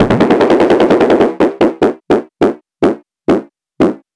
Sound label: bouncy